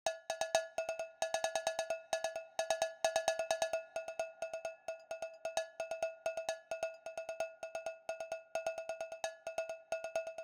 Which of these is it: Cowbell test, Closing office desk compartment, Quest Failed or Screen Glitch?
Cowbell test